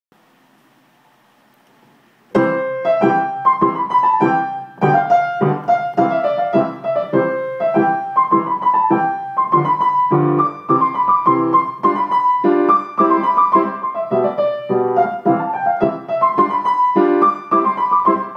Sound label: gypsy dance zingarese zigeunertanz; haydn joseph